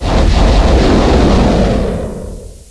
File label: weapon